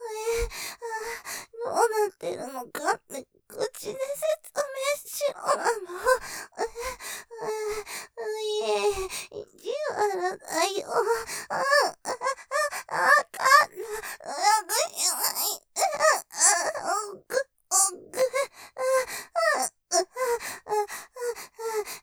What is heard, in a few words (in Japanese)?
喘ぎ◆どうなってるのかって?口で説明するの?; ステレオ